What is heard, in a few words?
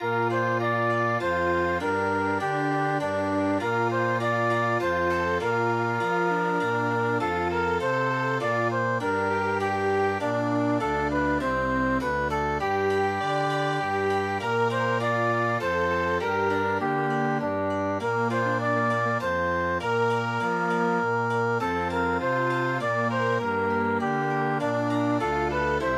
Chamber Music